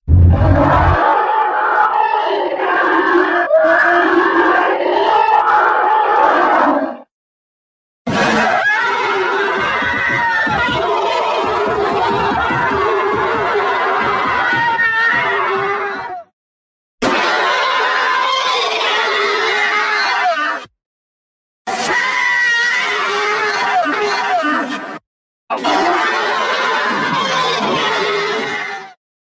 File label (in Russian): momo svinya